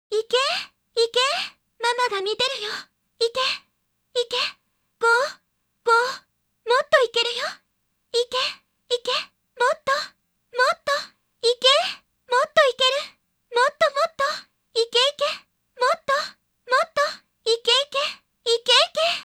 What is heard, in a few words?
cheer loop